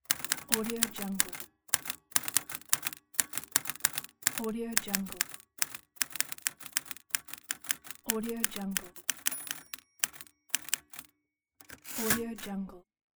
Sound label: typing on typewriter with carriage return